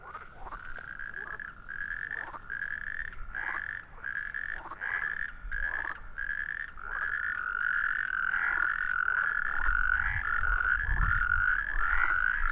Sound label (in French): amphibiens lac tuilerie venerque calamite; inventaire lac tuilerie